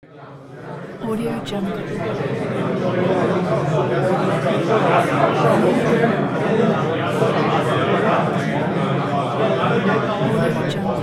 college students chatter in classroom

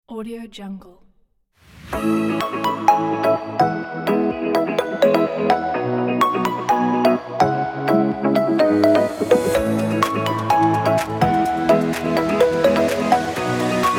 pop upbeat; dsk-records

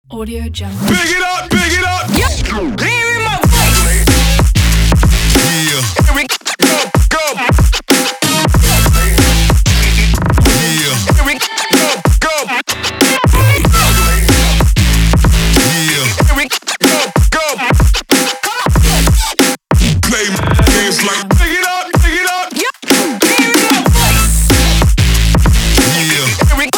sport intro aggressive energetic

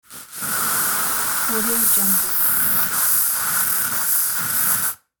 deodorant can spray

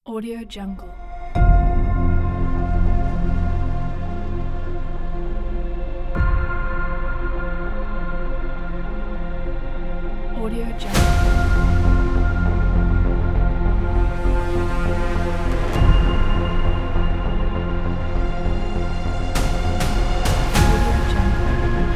cinematic trailer

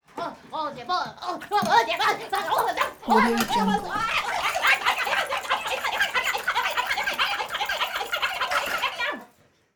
vocal goble bizarre comic cartoon gibberish

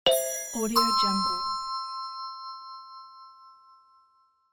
interface game diamonds sound

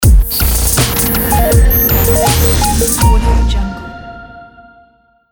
latest technology approaching logo

